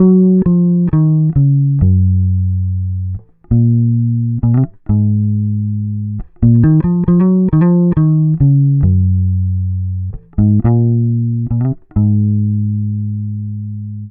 Bass loop 68 C#m, Electric Bass Loops Direct; Slate Digital BASSHOLE Sample Pack

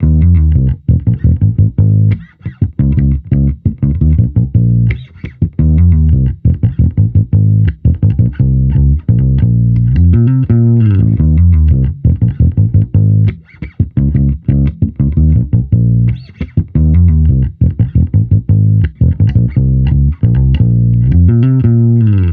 Amped loop 86 Fm, Electric Bass Loops; Slate Digital BASSHOLE Sample Pack

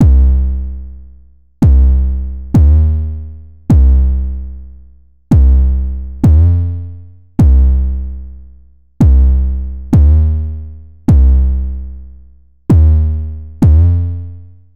808 loop 130 Cm; Slate Digital BASSHOLE Sample Pack

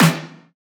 snare; Slate; SD MIDNIGHT Sample Pack; rider

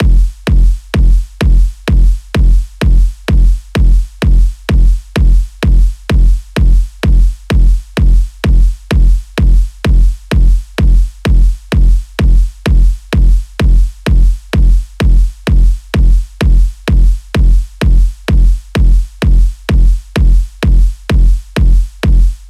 kick 128, Kick Loops; Drum Sample Pack; SD SEISMIC; Slate

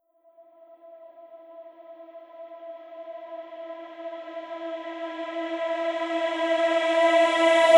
reverse, vocal sample; Slate Digital Omnivox Vocal Sample Pack

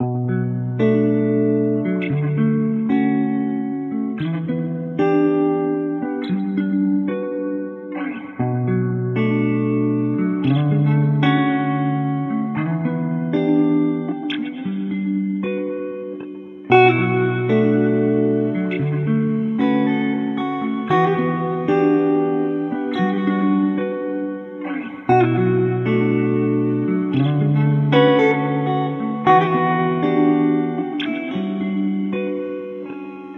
songstarter 115 Bm, Pop; SD PASADENA Pop Sample Pack; Slate

